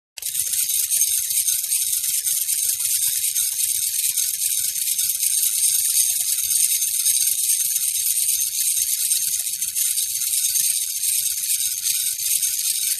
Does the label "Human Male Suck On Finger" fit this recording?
No